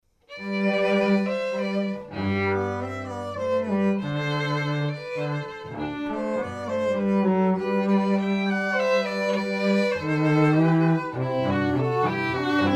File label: trio; oliver